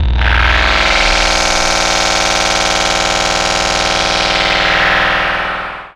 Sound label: pressure